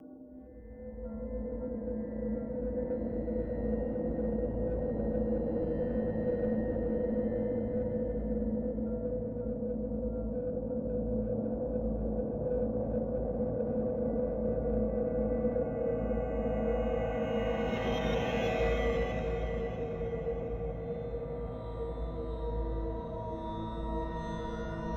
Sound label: The Bunker Ambience